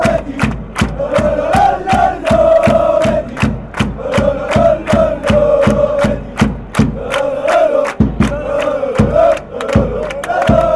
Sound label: lo lo, fan chants; betis